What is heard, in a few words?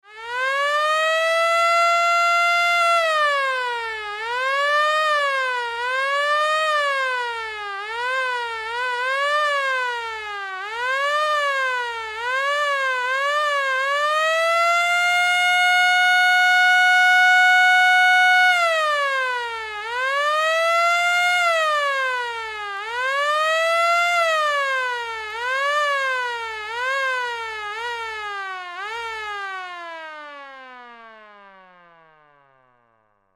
Fire Alarm, Fire Alarm Hand Held Crank Vintage; Emergency